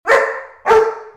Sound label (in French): Chien; bruitage